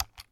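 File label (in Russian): одиночный приглушенный звук нажатия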